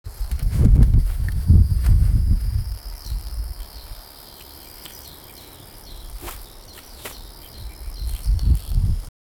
the sound of goats in, ambiance

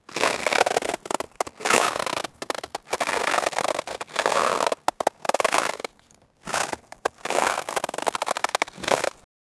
ice sled going fast in

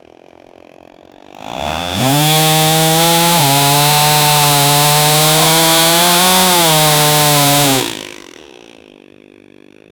chainsaw starting; tool

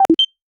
professional alert tone, mid frequency

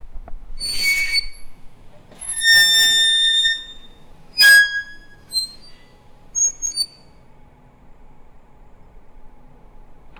a clap and squeaking meta

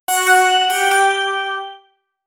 short witch theme sfx

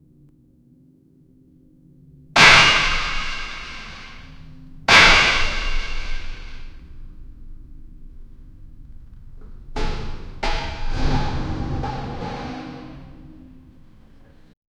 hollow concrete blocks; impacts

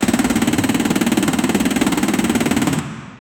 triumphant round win sound

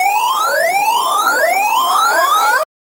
magic time warp; time travel

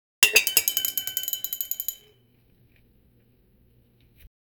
6 fork clattering on plate; ambient noise

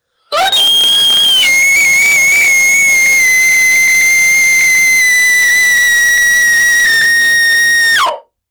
screaming; human vocal

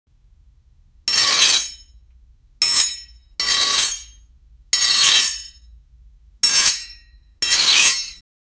knife sound effect; weapon

